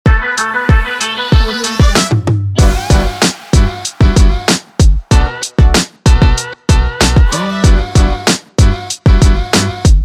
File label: punch hip hop